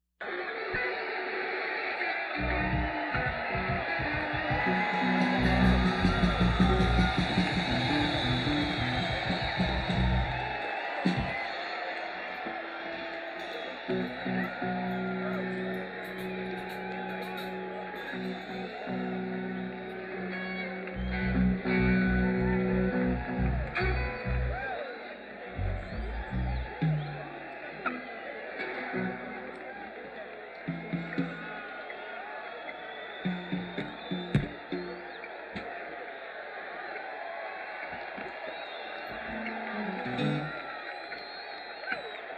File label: Crowd